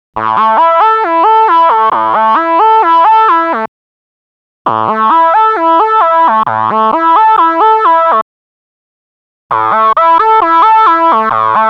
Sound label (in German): vergleich solo